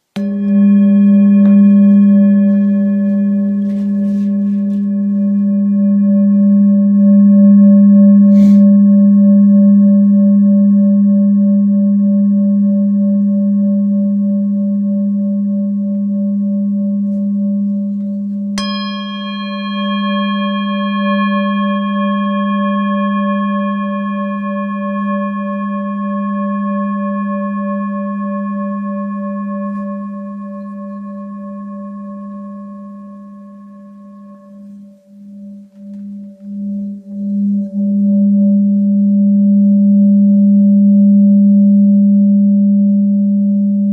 bol bengale Sol

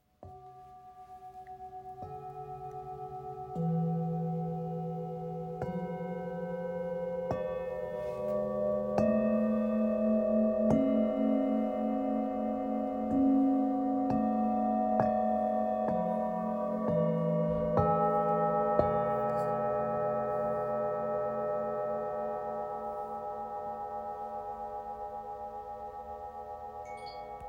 Set chakras

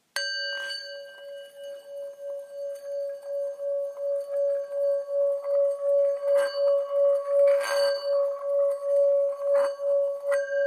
Bol bengale 3-400 dodiese

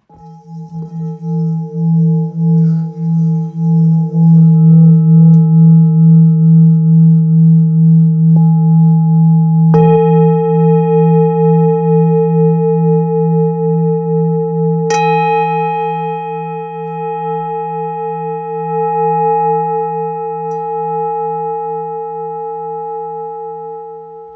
Bol ancien la

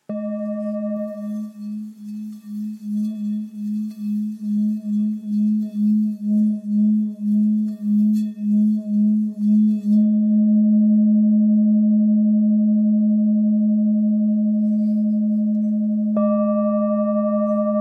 Bol thick sol diese

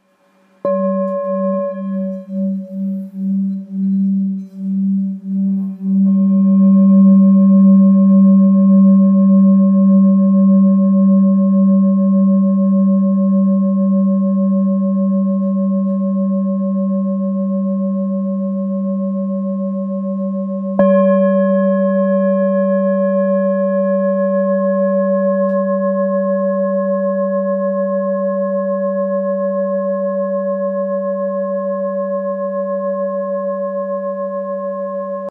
bol bengale Sol

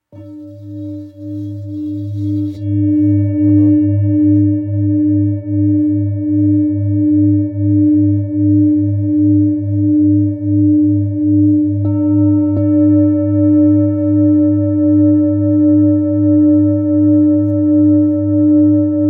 Bol grave sol 1.6kg fleur de vie

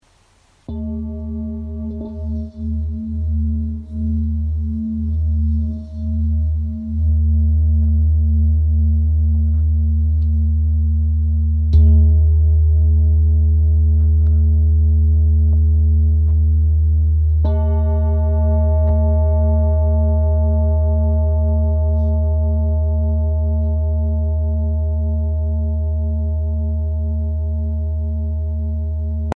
Bol ramgandi 1.575kg re diese

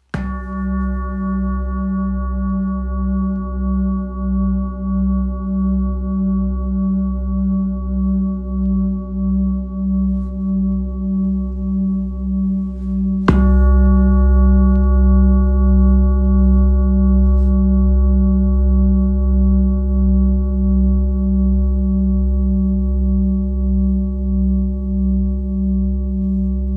gros bol Do 9.65kg

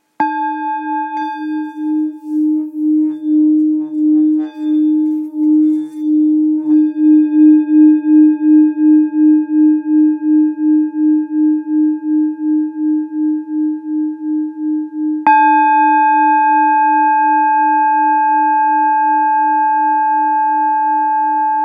Bengale re diese

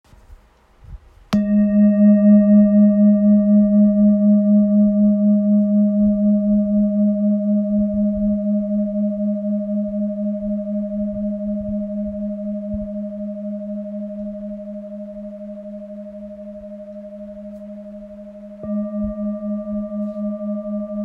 Bol thick sol diese

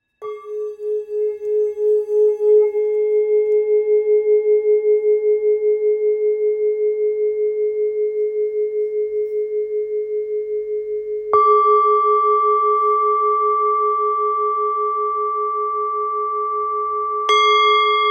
Bol ancien sol diese

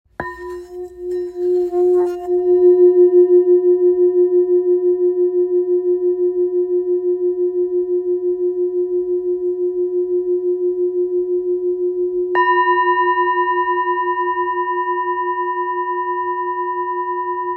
Bol grave fleur de vie fa

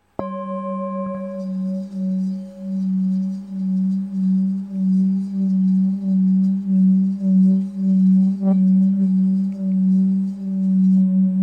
Bol bengale 1 2-1 3 fadiese

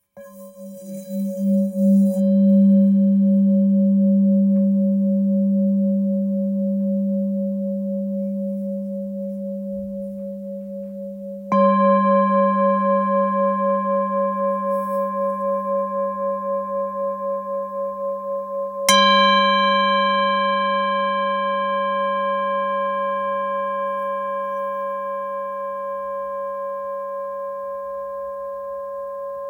Bol ancien fa diese

